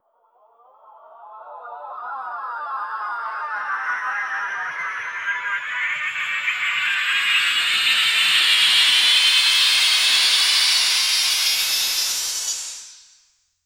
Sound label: sound of appearing and di, appearance